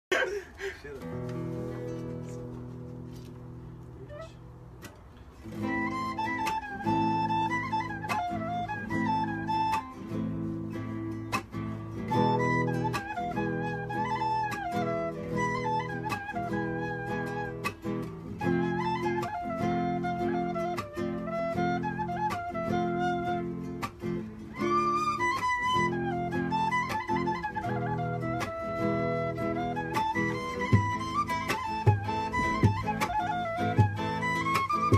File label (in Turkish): flut gitar